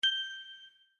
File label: earcon correct